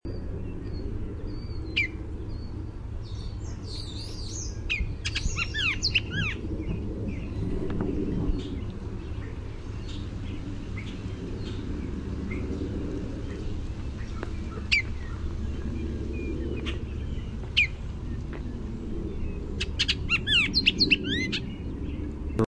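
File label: Bullock's Oriole call song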